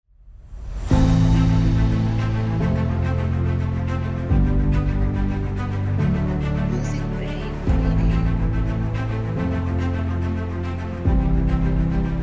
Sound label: Heroic Cinematic Orchestra